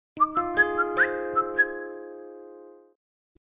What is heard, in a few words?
logo; APPART CITY